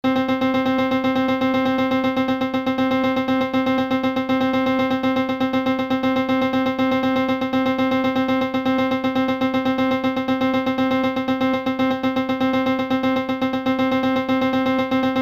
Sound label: param duration 0-127 normalized